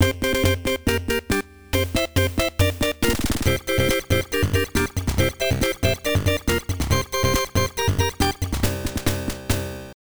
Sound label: Battle Draw; bomberman 94; turbografx 16; pc engine